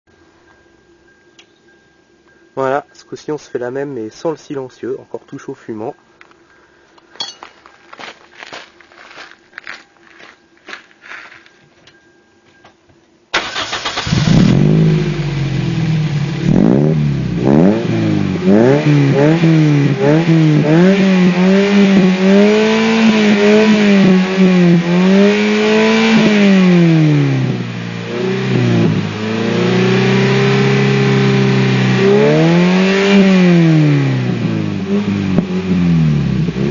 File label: ppe header race cat apexi n1 no silencer, exhaust